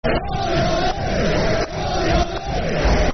Barmy Army, Football Chants (Soccer Songs); Blackburn Rovers Brfc

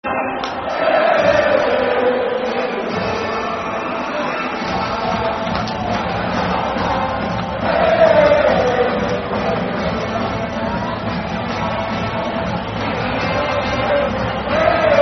Football Chant, Soccer Song, Netherlands; Nah Nah Nah Na; Holland Hfa Netherlands

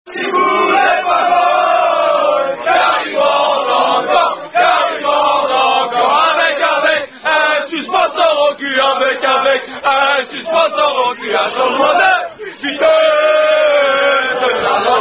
Kibu Song [Unknown French Rugby Song]; Le-Coq-Sportif

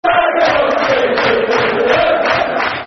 Football Chant, Soccer Song; Southampton; Saganowski; Sfc

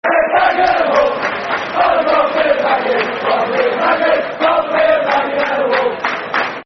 Rather Be A Baggie Than A Wolf, Football Chants; West Bromwich Albion Wba; fanchants

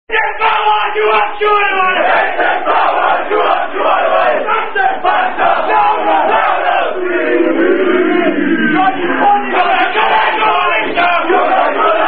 Rugby Song

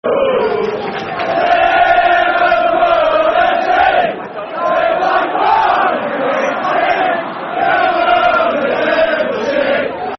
Liverpool F.c., Football Chants (Soccer Songs)

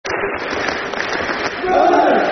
Clap, Football Chant; Rovers; Doncaster Rovers Drfc

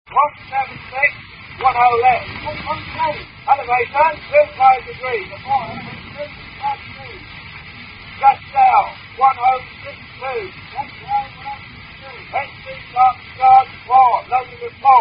Gas Shells Bombardment; Documentary; the great war

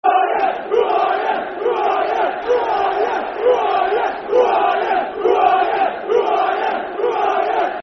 Who Are Ya Football Chant (Soccer Song); Bradford City Bcfc

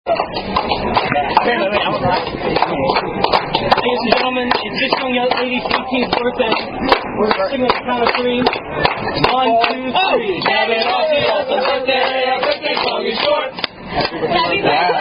Happy Happy Birthay This Birthday Song Is Short; Outback

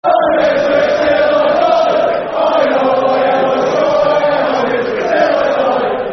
Ipswich Till I Die, Football Chants; fanchants; Ipswich Town Itfc

